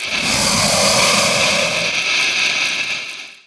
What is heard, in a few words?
antlion poison ball; npc